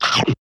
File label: chomp; flappydick